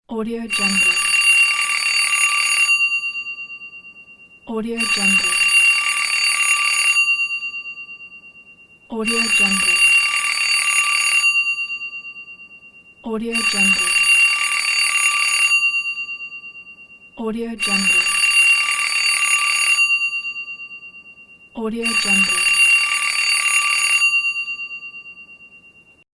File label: old phone; alexnaobum